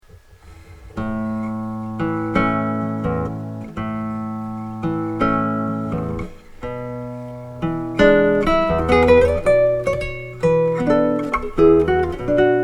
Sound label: harana rhythm; the haranistas refer to this rhythm as danza